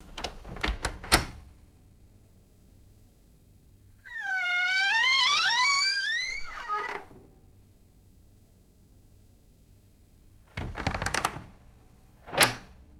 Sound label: wooden closet door opens and closes with creaks; doors